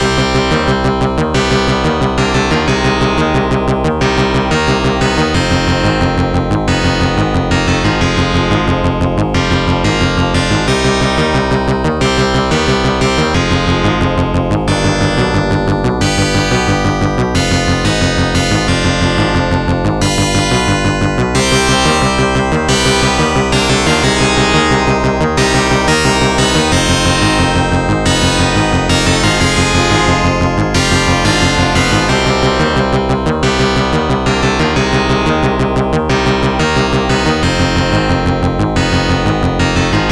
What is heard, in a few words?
arcade stage 3 4 cave; ghosts n goblins